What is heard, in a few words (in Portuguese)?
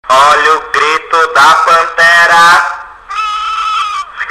grito da pantera